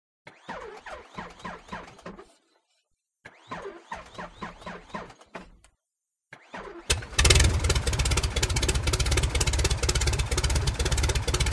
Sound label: Starts With Wheezing And Grinding. Idles Slowly, Then Steadily Builds In Speed. Abrupt Rev Down, Low Idle, And Off. Close Perspective. Prop Plane, Tiger Moth